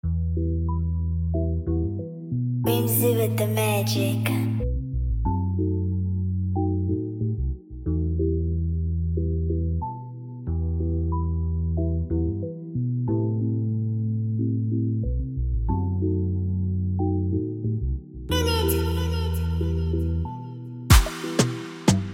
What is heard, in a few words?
Afrobeat Instrumental